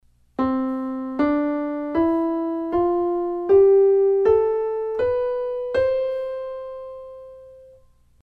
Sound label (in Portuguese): escala ascendente